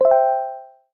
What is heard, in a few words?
system mail